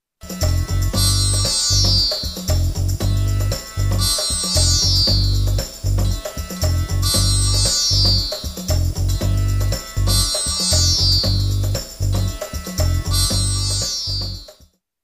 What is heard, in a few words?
tamboura alta